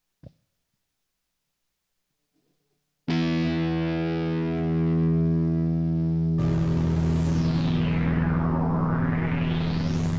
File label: Psychedelia Progressive Rock Intro